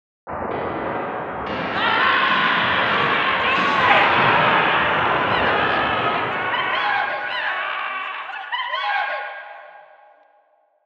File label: rampage; cardboard kaiju